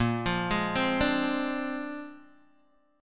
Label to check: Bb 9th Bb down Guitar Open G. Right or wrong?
Right